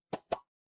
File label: cartoon pop